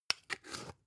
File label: spice jar big scratch close slow; industrie wirtschaft; gastronomie